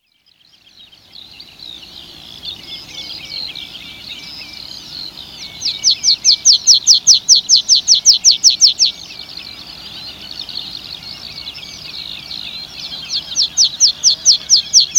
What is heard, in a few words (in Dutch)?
graspieper